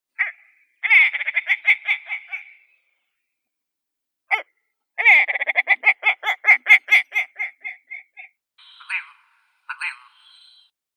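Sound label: willow ptarmigan, Lagopus lagopus; kuropatka belaya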